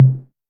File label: TR808 Tom, Drumset; Roland SH-32; Drumset 04